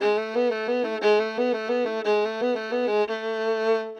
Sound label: test valse fond